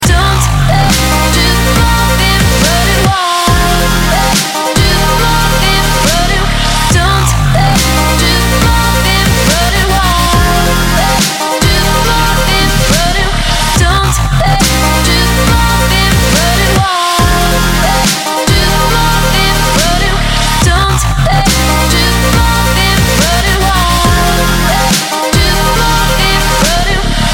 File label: dubstep music